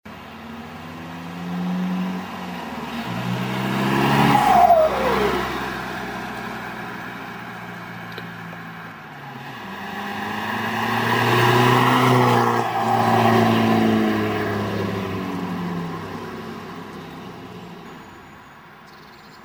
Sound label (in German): A3 2.0TDI vorbeifahrt; Der Audi A3 2.0 TDI in der Vorbeifahrt; Sound- und Geräuschdatenbank